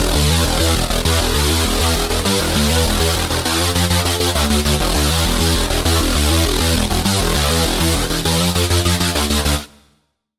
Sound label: Lead; Devour The Void; Routed Sound; Serum 2 Expansion - Doom Carpenter Vol.1